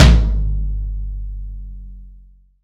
BASS TOM

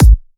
Trance Kicks, Bassdrums

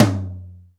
DRY T, drum; Crypton; DrumBase5000

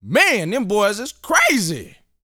VOCAL F; ILIO

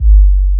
BASS, Drum & Bass, 160 BPM; Ueberschall; Drum & Bass Flash Trackz

